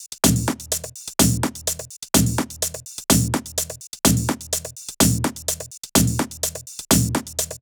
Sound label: 126 Top; Undergroundizer